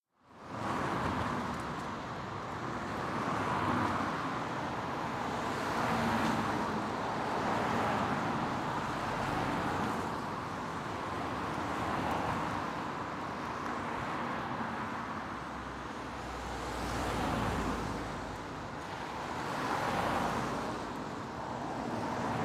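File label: ambience, City Road Day; noise creations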